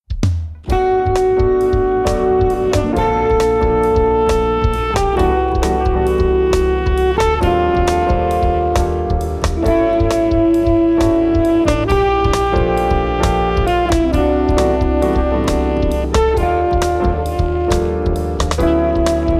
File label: jazz dance